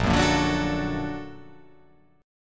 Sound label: A sharp Augmented Major 7th A sharp, guitar